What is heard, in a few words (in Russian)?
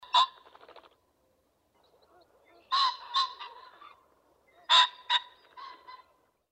zvuk fazana